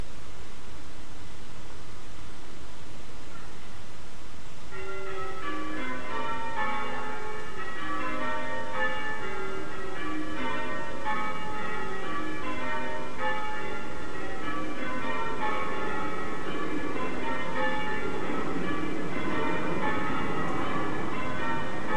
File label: ringing in rainy richmond with bird chirps